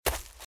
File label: Footsteps - Leaves - Run - Left; RadwinIsland